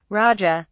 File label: Ringtone